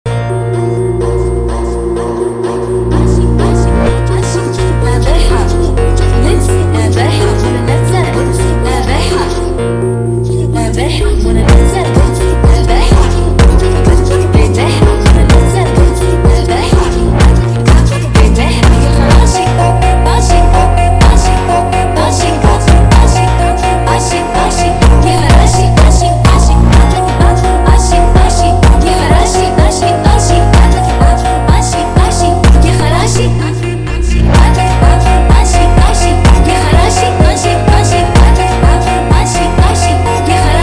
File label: PHONK